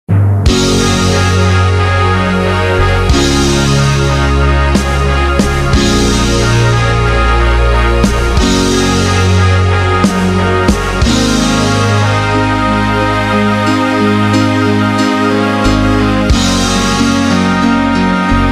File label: Orchestral Break